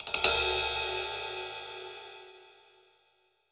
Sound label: Pop Ride Phrase, Cymbals; Roland BK-5